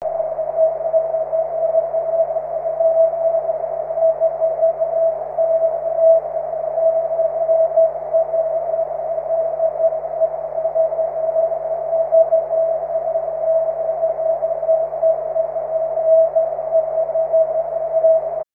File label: cw, 23cm